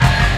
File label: hit, brass